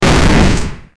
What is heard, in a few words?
thunder; star wars exegol; artifact